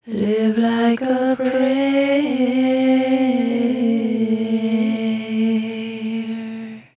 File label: partikkel basic time